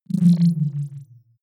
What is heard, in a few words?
wobble drop splutter low